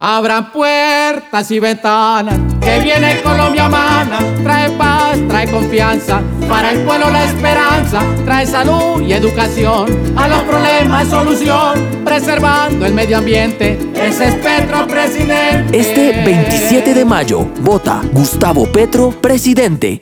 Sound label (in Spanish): Jingle LLANERO CON LOCU RADIO; PETRO PRESIDENTE